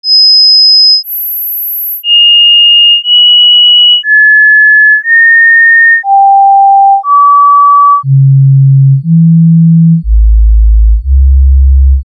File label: kepler scale